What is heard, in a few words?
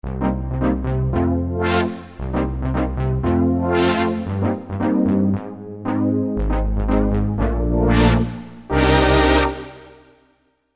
UK Swing Pad, Roland SR-JV80-06 Dance